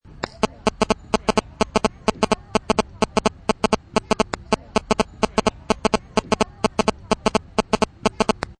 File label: Electrical Noise, static noise; Naty static noise